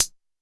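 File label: TR808 closed hi-hat, Roland SH-32 Drumset; Drumset 01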